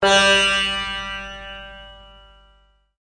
tampura G2; WORLD

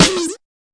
fx drum

